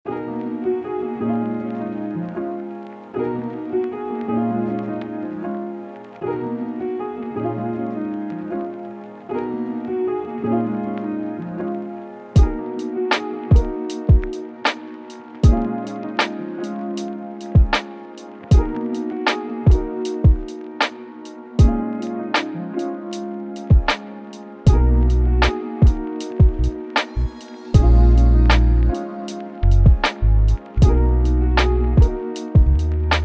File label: chill moon music warmth